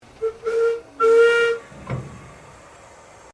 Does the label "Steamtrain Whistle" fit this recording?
Yes